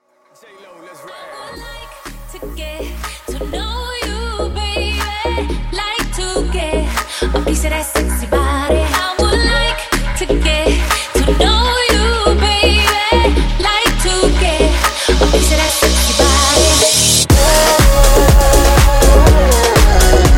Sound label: Afro Tribal 122 BPM